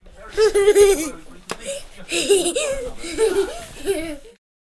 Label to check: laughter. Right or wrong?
Right